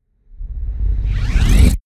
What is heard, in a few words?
sweep